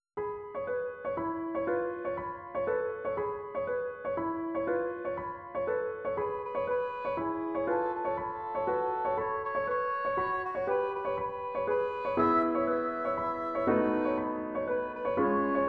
Les clochettes des muguets E accompaniment

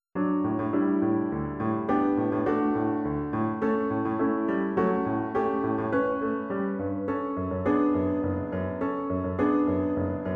Guitare sample sound

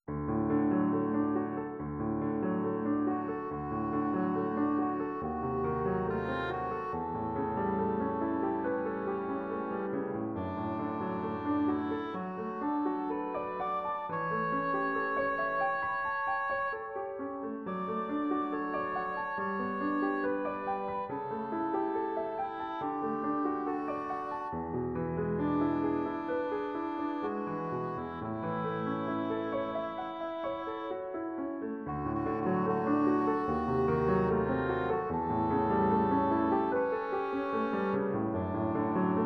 Si mes vers avaient des ailes D accompaniment

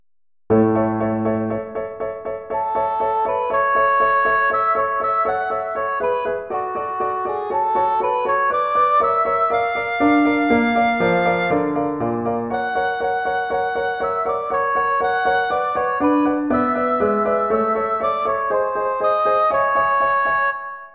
Villanelle

